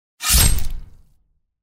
vonzili mech

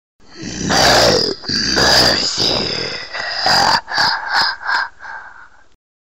strashnoe hihikanie zlodeya

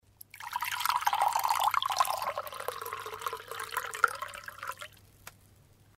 nalili vodu v stakan

